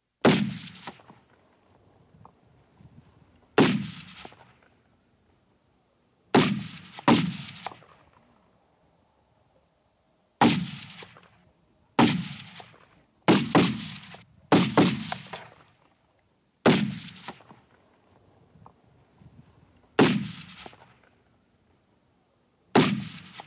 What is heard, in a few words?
japan gun